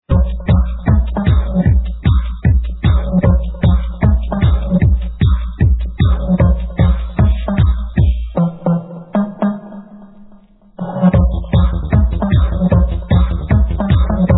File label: tomtom; radicalalcala-torrijos